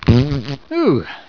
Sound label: Fart; hl1mp; XDM